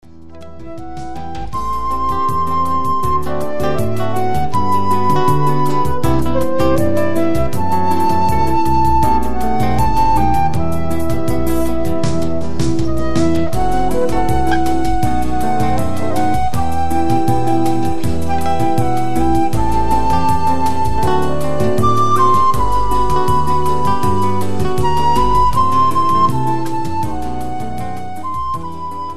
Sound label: Modal Harmony